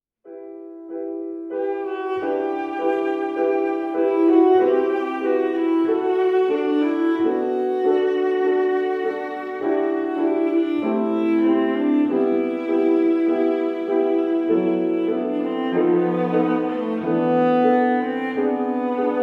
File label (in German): Vocalise, viola mit klavier; trauermusik leipzig